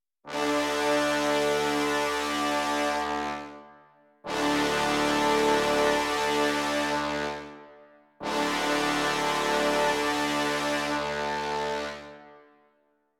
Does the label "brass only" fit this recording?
Yes